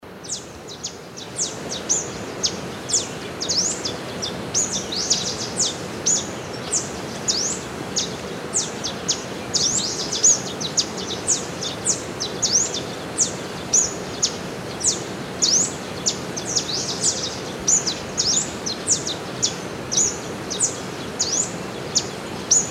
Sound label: rusty browed warbling finch